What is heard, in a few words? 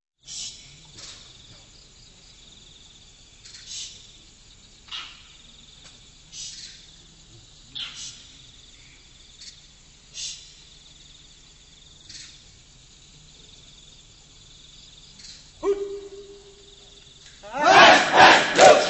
Kecak; World Sounds